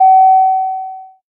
Bell

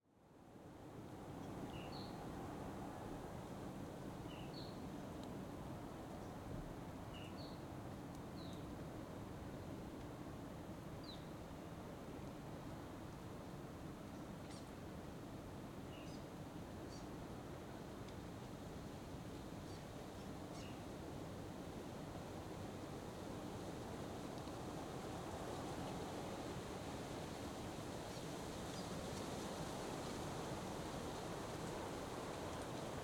New Zealand Great Barrier Island forest; North Island; The Sound Keeper; New Zealand North Island Ambience

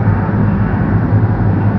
pipe, air exchange; hl2

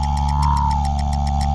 roll; hl2

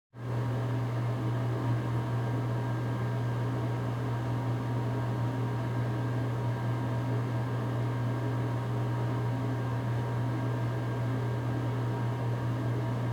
Room Tone Bathroom Fan; 5.1 LCRLsRsLFE; 2496SoundEffects; Surround a Room 5.1- Stereo Room Tones

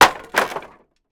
Small Wood Pile, Smash; Double Trouble Audio; Tools and Wood

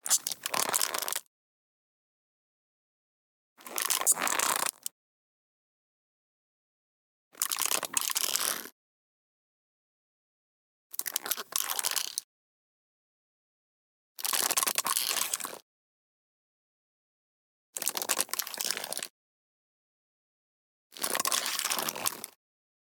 SQUEAKS AND CREAKS Headphone Squeaks Short; Squeaks and Creaks v2

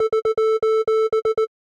SOS, Morse Code; InMotionAudio; Morse Code Samples

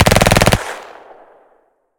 gun submachine auto shot automatic; Gamemaster Audio; Gun Sound Pack

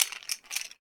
Wood Rattle; Robert Cordova

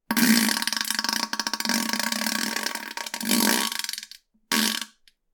Farting Wet Long; InspectorJ; 44.1 General Library

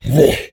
Orc Attack VO, Monster Roars; Phase 4 Libraries

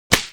Hit; NoizBoy; Hong Kong Action Kit - Hit Kit #1

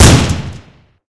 ar2 fire; hl2

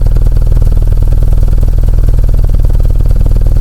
atv idle; hl2

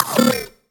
notification, Digital Sci Fi UI; The Mooseman

